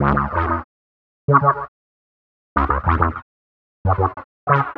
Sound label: Wah Synth; Piano Stomp